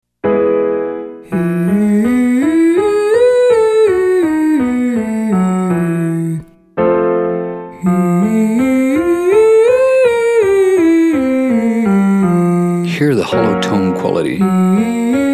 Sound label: Exercise 5 hooty eee female; Singing